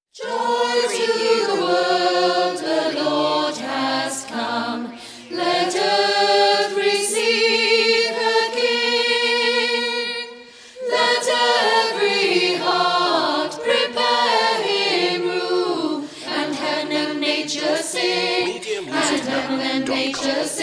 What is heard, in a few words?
joy to the world choir